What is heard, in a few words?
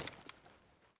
gear stereo; Escape from Tarkov